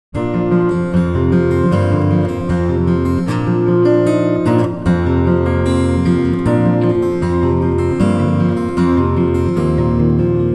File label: a little tune